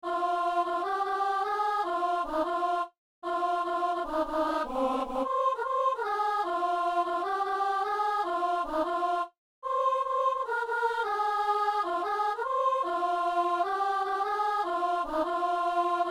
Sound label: soprano; Messe du 17 janvier à Saint-Denis